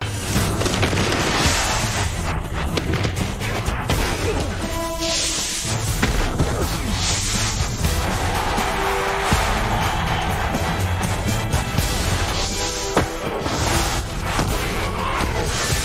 dramatic 123bpm

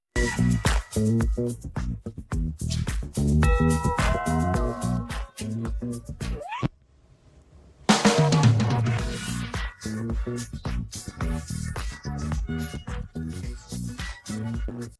groove pop

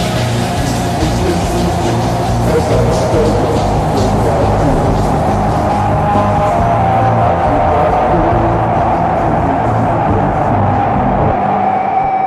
punk rock sample

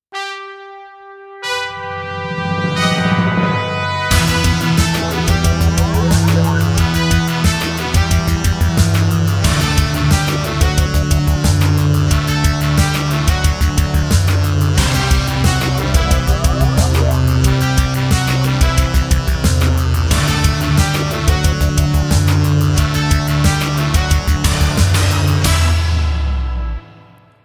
power cue; best service

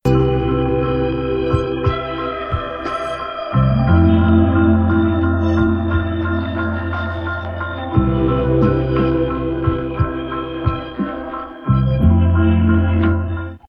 dreamy pop

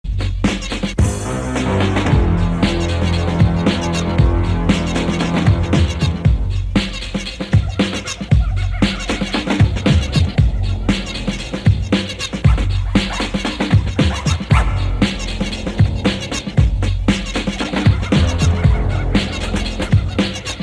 hip hop

